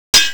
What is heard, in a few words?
wrench hit